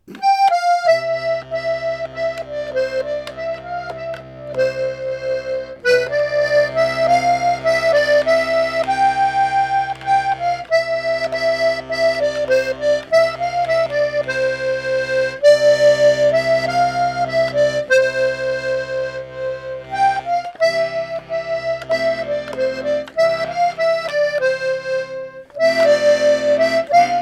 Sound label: Bourdon; Groesbeekse Bourdon